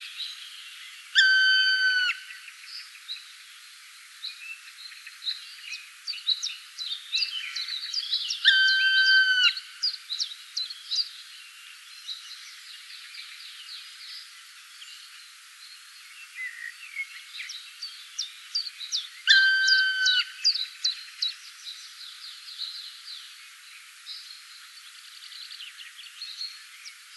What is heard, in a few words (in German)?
Schwarzspecht; Ornithologie